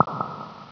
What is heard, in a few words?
echo, cave